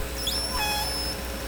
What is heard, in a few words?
call, Orca; SRKW; Alwin; S42